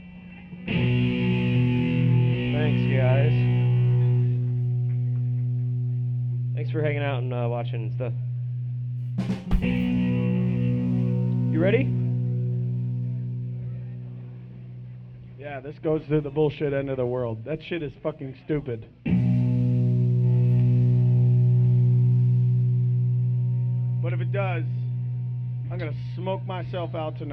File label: Static Radio